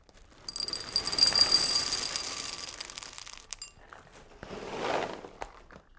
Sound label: Cereal Pour Into Ceramic